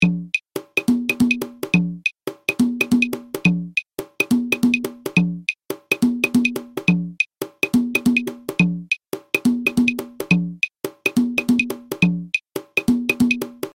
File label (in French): guarapachangueo cajon clave; Naissance du Guarapachangueo